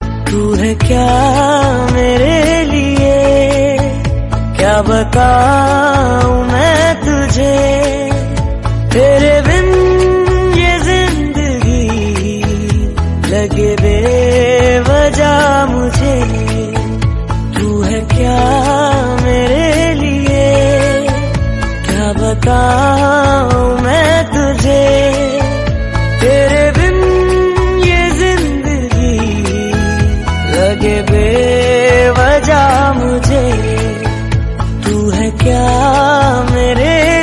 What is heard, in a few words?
Hindi Ringtone